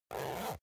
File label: zipper use short